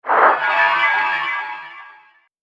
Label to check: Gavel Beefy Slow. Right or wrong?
Wrong